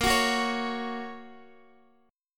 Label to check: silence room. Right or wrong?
Wrong